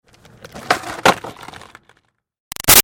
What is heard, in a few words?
Skateboard Olly Standing; Skateboarding; Sports; Big Room Sound